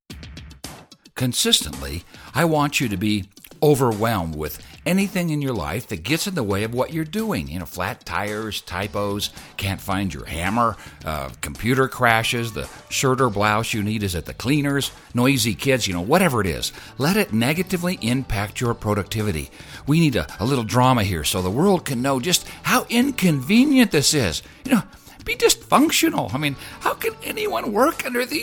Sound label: music